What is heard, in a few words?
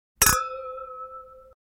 Double Glass Ding Crystal Champagne Flute Toast, Household